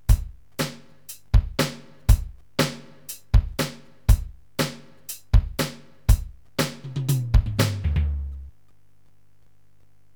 LOOP, DRUMS

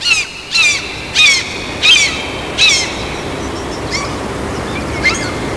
SEAGULL

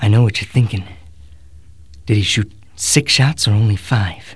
6 shots

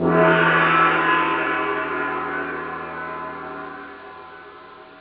gong; OnTheBall

